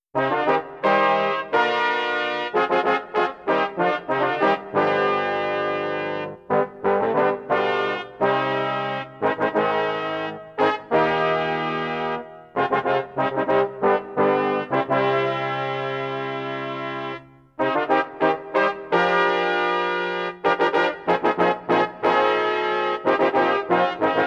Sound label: Renaissance Brass Music; John David Thomas